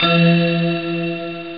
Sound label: Staccato Heaven; ST-XX Amiga Soundtracker Sample Packs